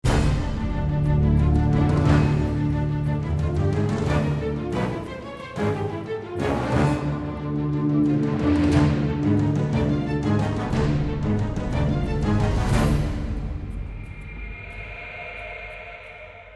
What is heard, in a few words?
Action